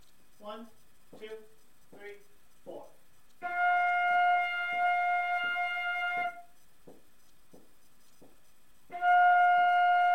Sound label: flute 1st 5 steps